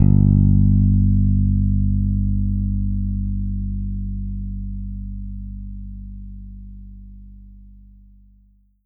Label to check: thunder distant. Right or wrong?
Wrong